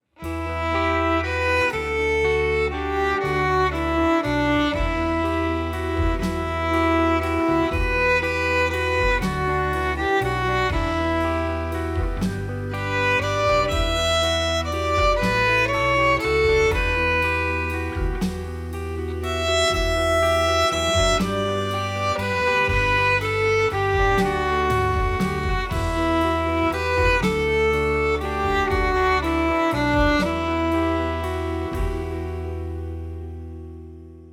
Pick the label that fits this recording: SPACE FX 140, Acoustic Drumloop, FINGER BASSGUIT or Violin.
Violin